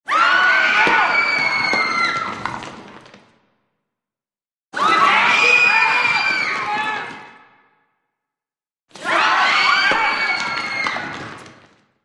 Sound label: Medium Mixed Crowd, screams